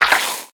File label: Warrior Acid Attack; we are the dwarves gamerip 2016